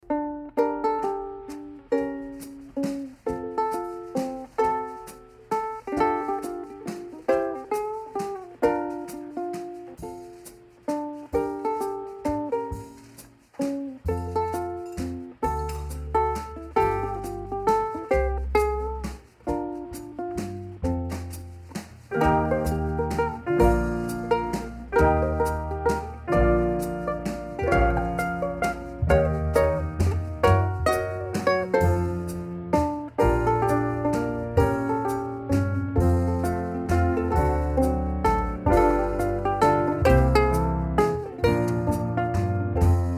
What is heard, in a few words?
Ukulele Nocturne